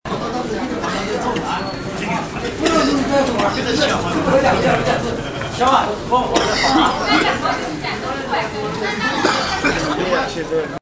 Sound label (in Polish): kaszlak; Chiny